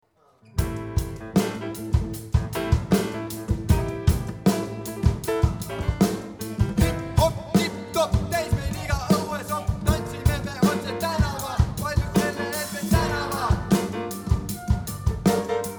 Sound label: Hip Hop